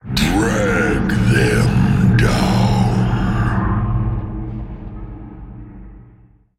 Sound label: Attack; League of Legends; Nautilus